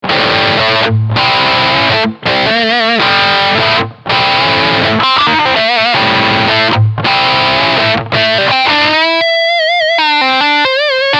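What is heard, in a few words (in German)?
Singlecoil Mitte Riff, guitar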